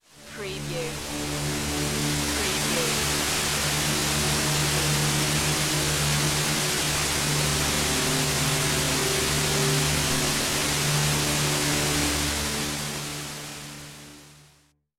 Trailer Production Elements - Effect - Whitenoise, Dark, Scifi, Synth